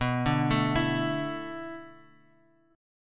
B-Minor 11th, Bass Standard; arpeggiated; JGuitar

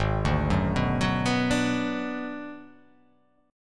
Ab Minor 7th Flat 5th Ab, down; arpeggiated; JGuitar

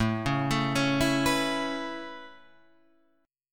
A Major Add 9th A, chord; Aadd9 Chord {5 4 7 6 5 7} arpeggiated

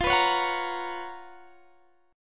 Db 13th F, guitar

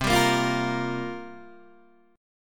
C 7th Suspended 4th, Guitar, DADGAD

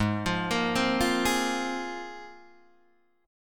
Ab 6th Add 9th Ab, Guitar, Open D

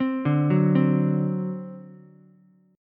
C Suspended 2nd Flat 5th Ukulele, Csus2b5 chord; Csus2b5 arpeggiated

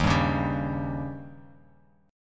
D sharp 7th D sharp; strummed; JGuitar

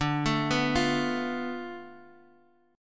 B-Minor 7th Flat 5th D

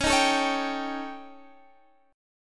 Eb 7th Flat 5th Db, guitar

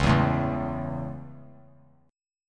C sharp Minor Sharp 5th; strummed; chord; 13,11,12,11; JGuitar

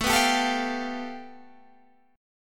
A 7th Suspended 2nd Sharp 5th

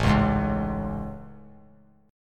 A Major 7th A; AM7; strummed; JGuitar

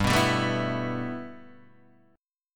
G 6th Add 9th G Guitar DADGAD, chord

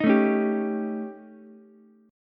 D sharp 7th Flat 5th, D sharp, down, Ukulele; strummed; JGuitar

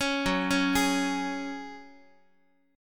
Gb Suspended 2nd, Guitar; arpeggiated; x,x,4,4,5,7; 4A,4E,5A,5D,5G#,6C#

